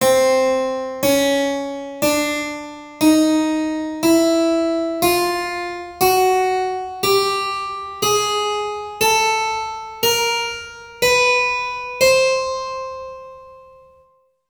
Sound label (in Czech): chromatika xylo